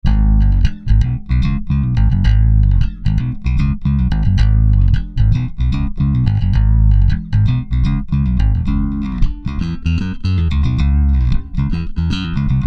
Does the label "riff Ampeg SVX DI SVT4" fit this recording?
Yes